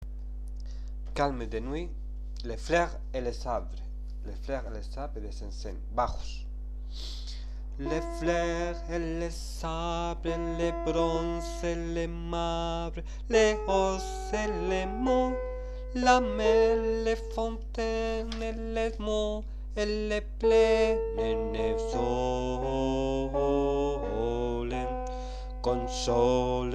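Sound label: bass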